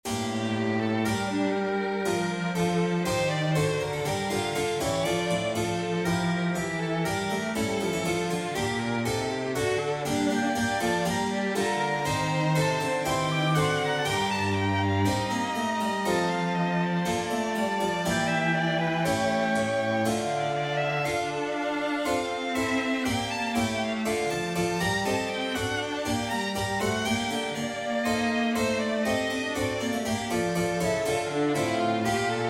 Trio Sonata g minor Allegro, Alto Recorder, Violin and Continuo